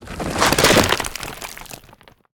Spider Trap Plant; Lurker; Evolve; Gorgon

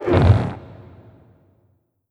creak; Strider Remake

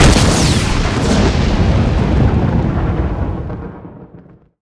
Lightning Strike Fire Strike; Evolve; Elder Kraken

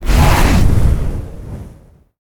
Death Wall Expire; Evolve; Elder Kraken

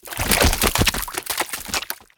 Ability Mimic Explosion Flesh; Lurker; Evolve; Gorgon

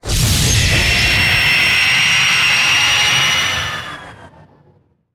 Banshee Missile Homing Start; Evolve; Elder Kraken

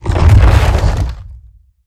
Roll Surface Start; Evolve; Behemoth

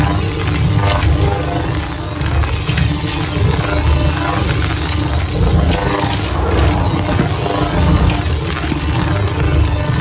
Banshee Missile Loop; Evolve; Elder Kraken

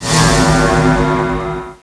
spawn

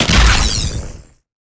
Chain Lightning Impact; Evolve; Elder Kraken

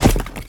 Tongue Grab Pull Back; Evolve; Behemoth

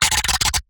Exertion Light Breath; Evolve; Gorgon

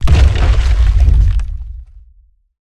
Rock Prison Formation; Evolve; Behemoth

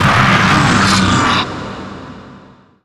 strider pain; Strider Remake

